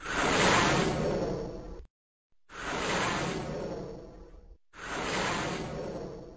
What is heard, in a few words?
Meteor Storm